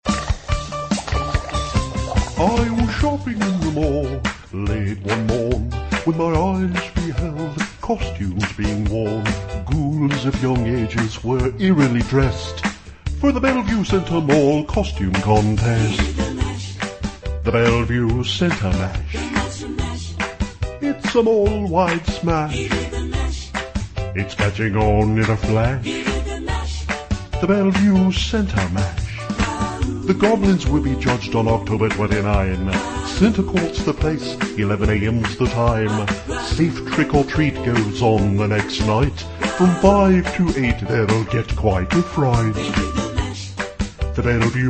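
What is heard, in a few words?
American English, Singing; GoLocalise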